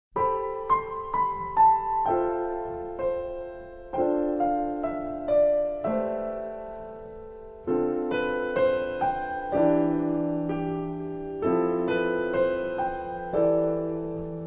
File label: piano